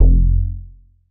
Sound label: bass classic rich F; Polyend; ARC Noise